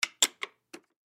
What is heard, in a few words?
Poker Chip Movement, Poker Chip Movement Single Flip Settle Felt Table; Casino; Poker Chip,Flip Settle Felt Table; Sports; Blastwave